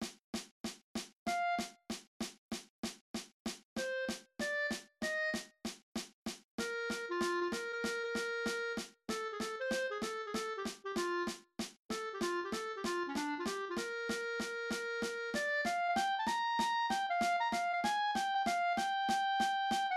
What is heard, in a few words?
Just a Closer Walk cut time clarinet; senior high band